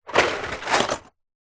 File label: leisure board game box tip movement pieces move; monopoly